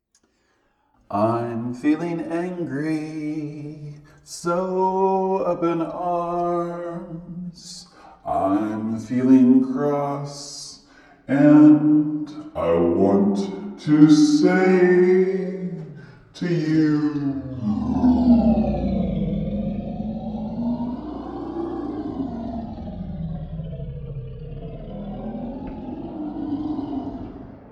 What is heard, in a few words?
Grr